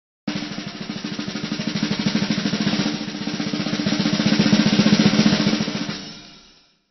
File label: Drum fills